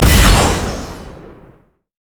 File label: heat seeking missile lock; urgot; LoL